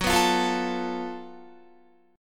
Gb Minor Major 7th Double Flat 5th Gb Guitar; strummed

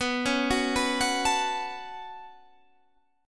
C sharp 7th Suspended 4th Sharp 5th B

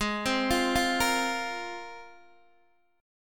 Ab 6th Add 9th Ab, Guitar, DADGAD

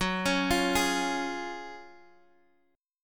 Gb Major 7th Suspended 2nd, Guitar Half Step Down

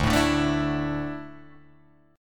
D Suspended 2nd D down Guitar Open G

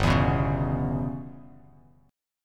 G 6th Flat 5th, Bass Standard, G6b5 chord; G6b5 strummed; JGuitar

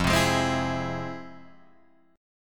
F Minor Major 7th, F, Guitar, Open G

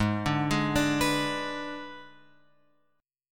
G sharp Diminished G sharp; G#dim Chord {4 5 6 7 X 7} arpeggiated

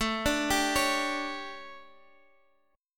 D Major 7th Suspended 4th, chord

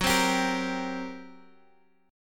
G Minor Major 7th Double Flat 5th, F sharp

